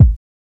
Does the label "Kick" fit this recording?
Yes